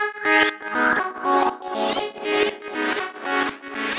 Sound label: 120 Prog Chopz Syn; Zero-G; Soundclash Nu Dance Electro; Combi Loops; 120bpm